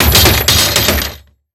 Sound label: Crash Metal Shopping Cart Crash; crashes; AdobeCC